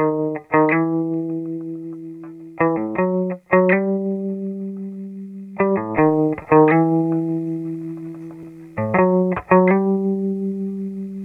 guitar

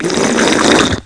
sip; Take2Demo; StormsSound

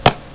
glove, Baseball; Action-Strategy Baseball

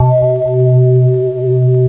Echo synth C2; BassHits

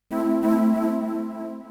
wings, 140bpm keyboard; hous-tec

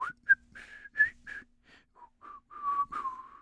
whistling; The Sum of All Fears; English Voice

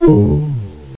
Voc Oh

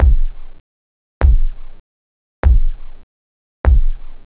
stomper, drums techno; OctaMED SoundStudio V1

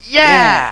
YAHH; NOAH DEMO

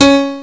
note cis

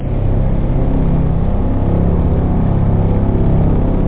strings; cadaver-strings2; Amiga 8-bit Sampled Voice

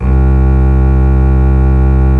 Contrabass; GMTones; Strings & orchestra

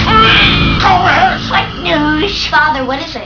Baby; AddamsFamily

